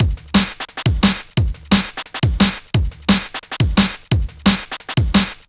D&B Drum Loop; neuro

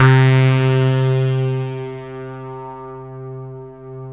SYN, Wavestation

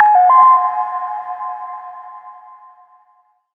SHORT, SHORTNOIS

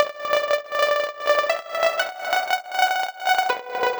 120 Sad Story Synth; Zero-G; Soundclash Nu Dance Electro; Acidized Wavs; Combi Loops; 120bpm

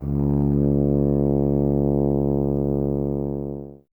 BRS TUBA, Solo Instruments

